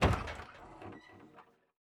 Aircrafts Bf-109K-4 Canopy Open; DCS

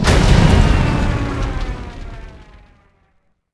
Gunship Die; Civ4 warlords

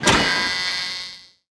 perk shooter; World of Tanks Blitz; GUI perk

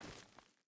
footstep burlap stones walk; Rust

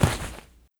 cloth; Rust

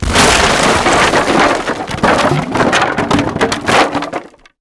wood building fall small

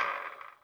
minigun spindown; Modern Warfare

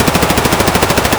mp5 indoor close loop; Escape from Tarkov

